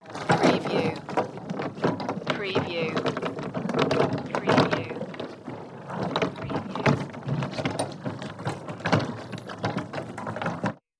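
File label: Horse Eating Pail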